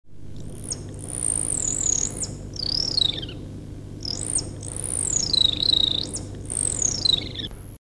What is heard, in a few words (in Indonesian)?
suara burung hantu betina panggil jantan